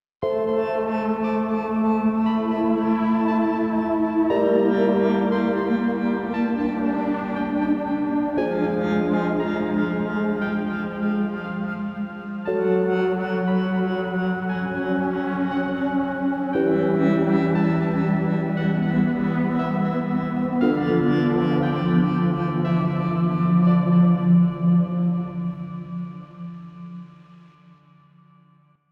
Day Stinger; dying light the following gamerip 2016

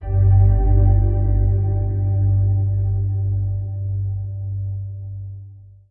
sting box close; monument valley

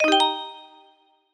Jingles Coin

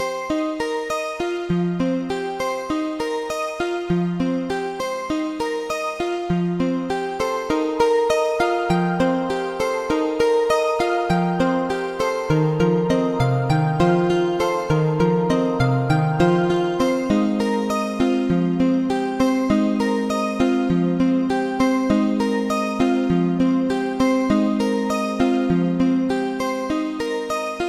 victory theme; Rift Wizard

